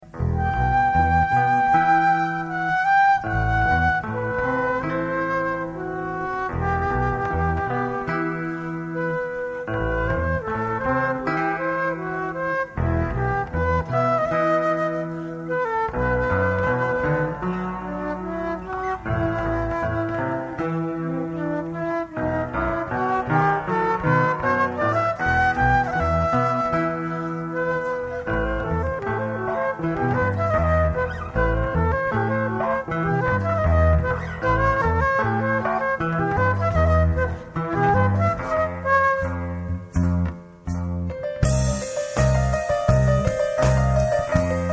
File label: solo flute et clavier